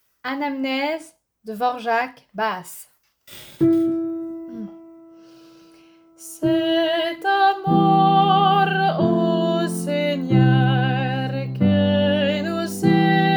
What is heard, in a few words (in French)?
Anamnèse basse; Dvorak